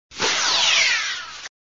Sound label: Sound SFX, flipper